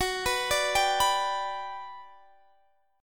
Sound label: G Major F sharp